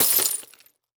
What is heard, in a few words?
Impact Glass Debris Hit By Plastic Tube; Impacts